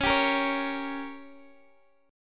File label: G Diminished, C sharp